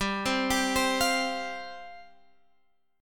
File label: G 7th Suspended 4th G, Guitar Drop D; x,10,10,12,13,13; down